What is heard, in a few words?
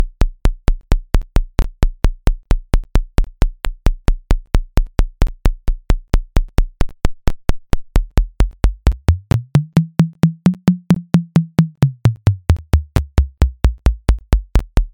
coffee beats